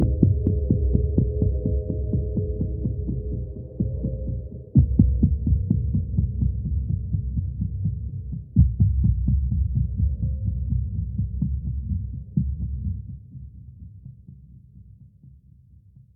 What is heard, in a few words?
Sneak